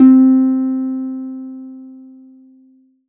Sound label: Roland SC-88 Harp C4